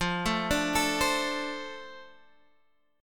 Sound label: F 6th F down Guitar Drop D